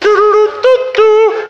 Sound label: toodle loo doo doo